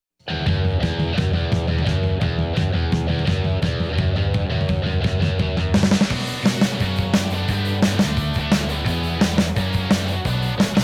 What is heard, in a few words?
Guitar